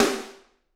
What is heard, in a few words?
SD, Drums; Bob Clearmountain Drums I